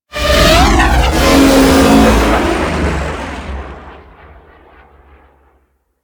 Ship Attack Maneuver Generic, Engines; Warframe; Vehicles; RailJack; RailJack Enemy Ships; Corpus

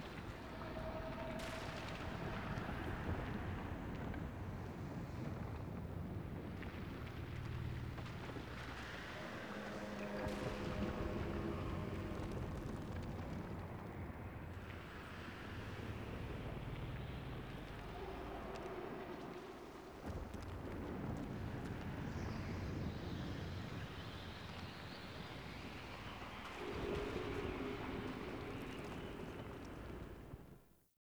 Valley Ext Ambience; The New War; Lua; Cinematics

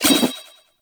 Spear Gun Equip; Warframe; Tenno; Hoplite

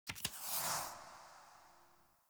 UI Collectible Tarot Card Slide In; Warframe

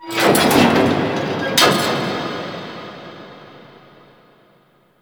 Mid Mech, Ambience; Guild; Warframe; Misc Remaster

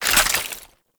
Bayonet Melee Whoosh Gear Accent; Warframe; Tenno

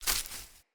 Grunt Foley Land; Enemy; Characters; Corrupted Sproutfolk

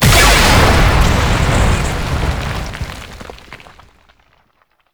Infested Radio Tower Speaker Destroy; Warframe; Ambience; NineteenNinetyNine

